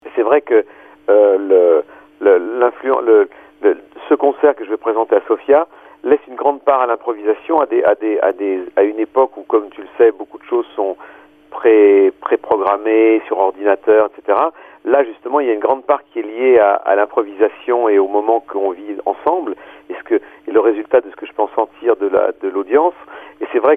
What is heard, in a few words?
jmjazz; Детско БНР